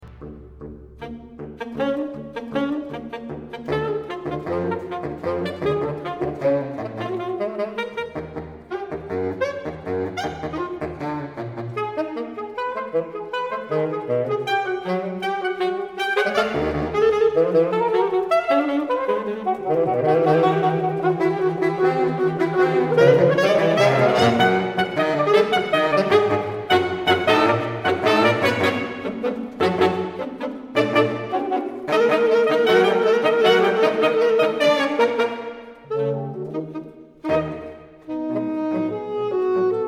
Prelude Fugue and Riffs for solo clarinet and jazz ensemble II. Fugue; L. Bernstein